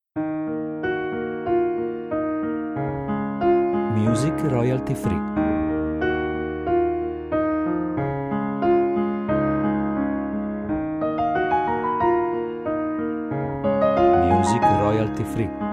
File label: Acoustic